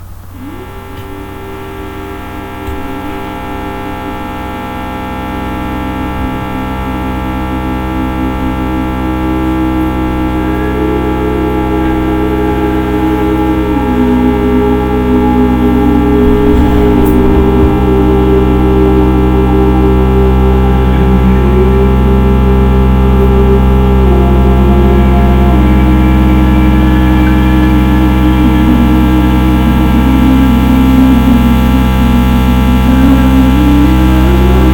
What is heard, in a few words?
voice sine; unlimited electronic sounds udk st2021